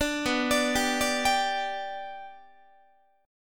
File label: B Minor Sharp 5th, Guitar; arpeggiated; JGuitar